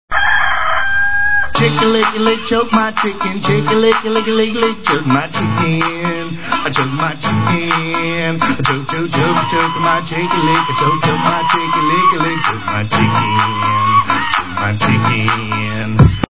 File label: choke chicken